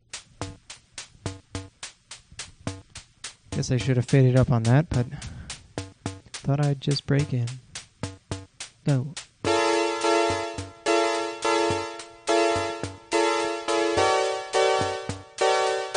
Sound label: Rhythm and P600 4ths